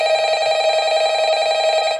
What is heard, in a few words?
phone call sound; fnaf 1